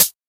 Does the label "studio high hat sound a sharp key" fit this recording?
Yes